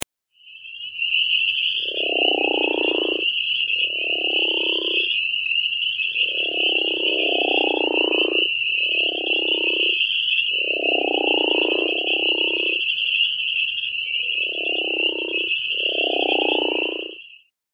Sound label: crawfish frog